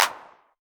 909 Clap, Novation Drumstation

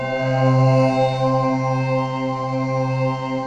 TS12 PAD, ENSONIQ TS-10; Rubber Chicken Software Sample Bank

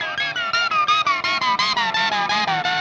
Static FX Guitar

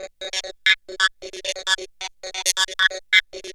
GUITARS 135, House

